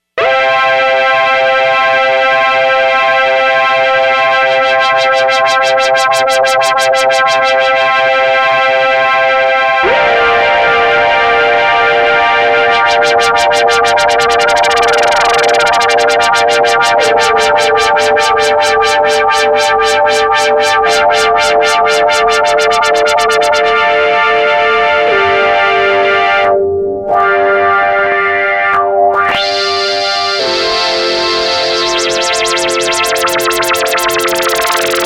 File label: POLYPHONIC FILTER EFFECTS Tremolo; INTERFACING FOR POLYPHONIC FILTER EFFECTS